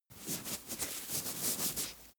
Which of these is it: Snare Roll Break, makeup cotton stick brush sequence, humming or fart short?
makeup cotton stick brush sequence